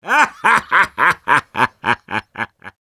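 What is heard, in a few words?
laugh long, Russian; Sniper; Долгий смех 2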